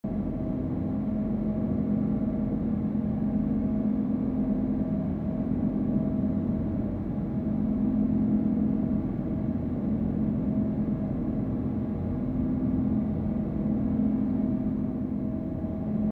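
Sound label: Ambience Room Tone Machine Loop; Blue Zone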